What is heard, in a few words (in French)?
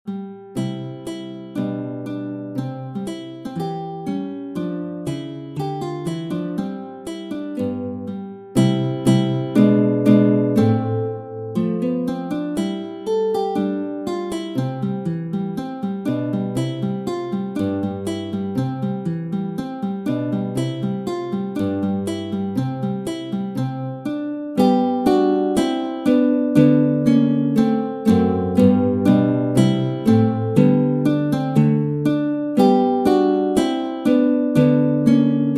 Andantino, Méthode pour la guitare; Ferdinando Carulli; Op. 241; Classical guitar